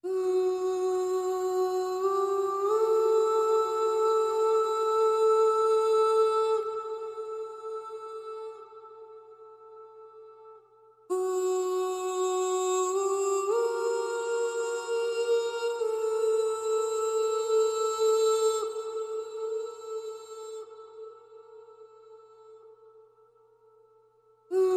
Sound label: Vocal Only